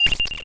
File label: Button press